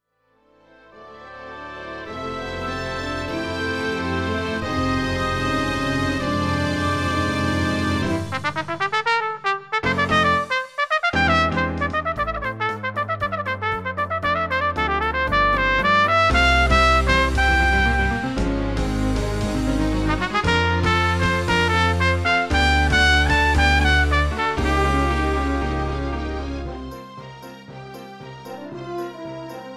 Third Movement, Trumpet Concerto with Bass Guitar Drumkit